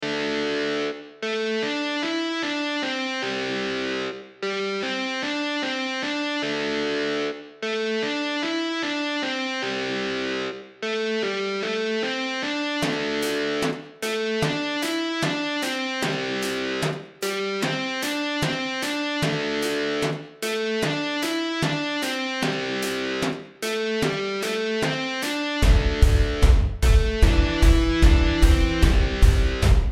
rock

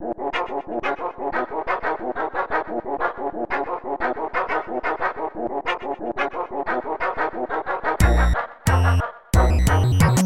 Dog March

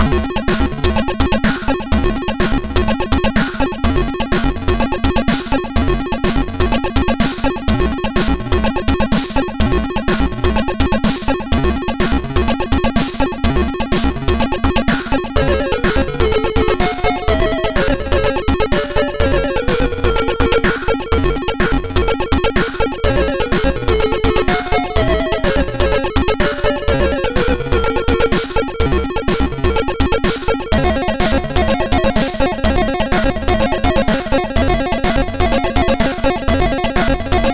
vic-20 sound chip emulator in furnace

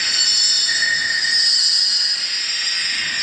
Atmos

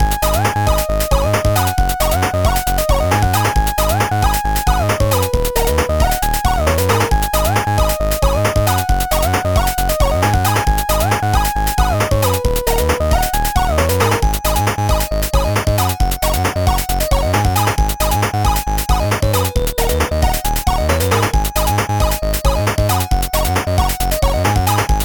goof beat

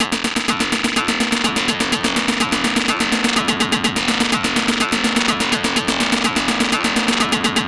accidental guita thats not a guitar; what the fuck is that

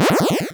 pew

